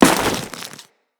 Crewman Step Ice, Footsteps; Corpus

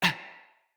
Pain, Battle Barks; Envoy

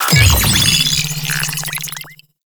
Drone Interaction Open, UI; Entrati

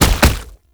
Gallop; Enemies; Steps; Proto Infested; Warframe; Brachiolyst

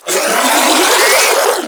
Infested Ancient Melee Mid Spin Scream

